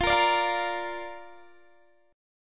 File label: D Minor Sharp 5th F